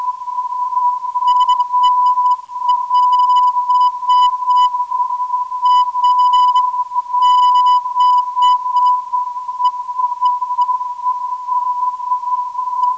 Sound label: 625kHz, ndb